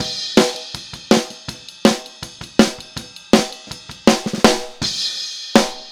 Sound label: Snare, Drums; Drums from the Big Room The Mixes; Take Your Toms Off; Chorus 05